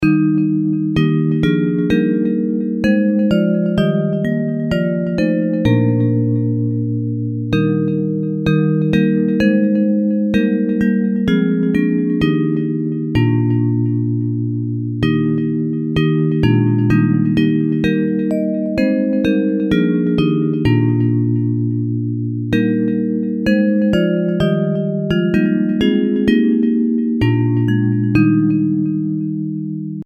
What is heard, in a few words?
Bells, Traditional hymn